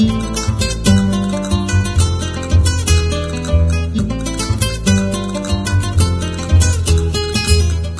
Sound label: Mexican Music; Diner Dash 2 Restaurant Rescue